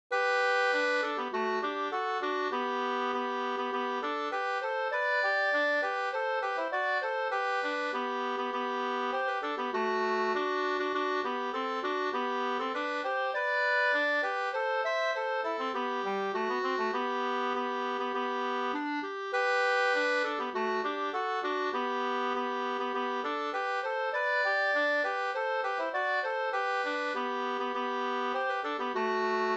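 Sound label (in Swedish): brudmarsch Karlebynejdens brudmarsch Finland 2 stämmor G dur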